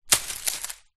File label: impact rock small land amongst leaves bushes